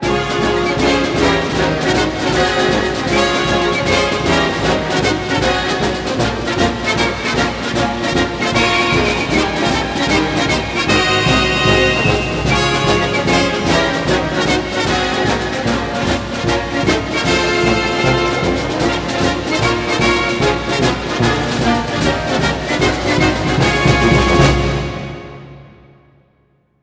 Fight Song

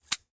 Snip

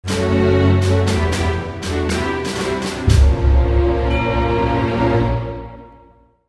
declare war uk

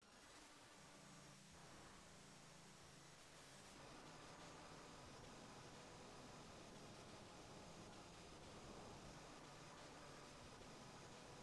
Boss Appeared; Bombaman Extra Ammo; MSX2

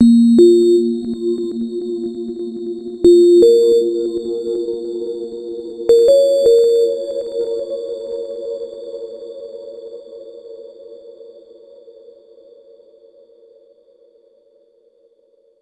Tone

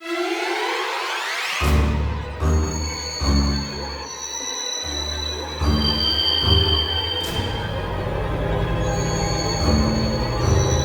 Horror; The Hidden Treasure of Area Zero Part 2 The Indigo Disk

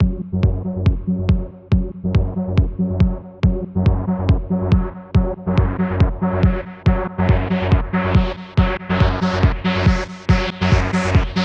minimal; Avoid Sensory Overload

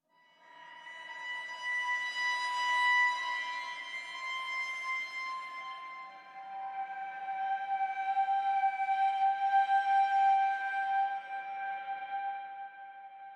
Boss Stage; Mirador

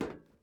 metal step

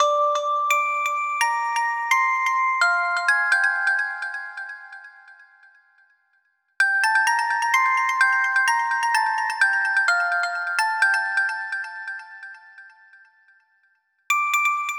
Melody

